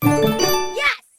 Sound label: win